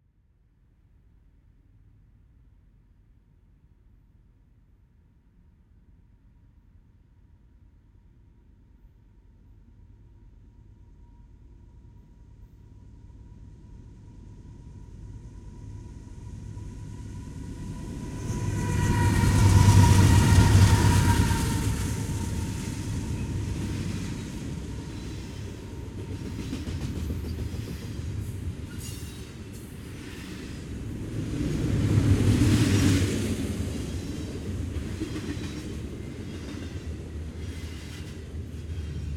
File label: Train, Freight, Locomotive, Cars, Up, By, Away, Engine on End; Neumann; Vehicles Freight Trains